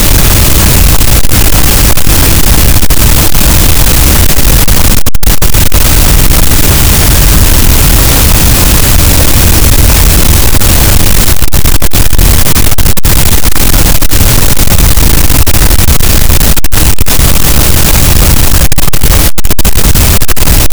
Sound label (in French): cachalot, Physeter Macrocephalus, gaussian noise